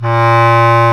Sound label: BSCLAR; Roland - LA Composer vol. 1; WOODWINDS